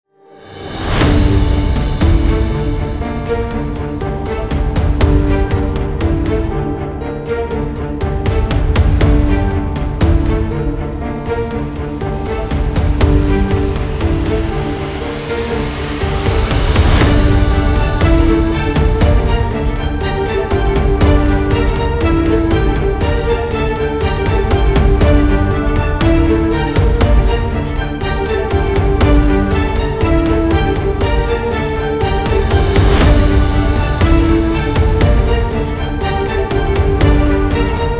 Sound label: Game bgm; Degullmok